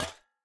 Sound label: step, coppergolem; Minecraft